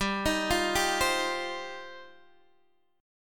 G 7th Suspended 4th Sharp 5th G down Guitar Open D